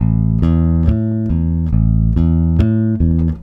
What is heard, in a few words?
Country Bass Pre Bridge; Digidesign; Pro Tools Essential Loops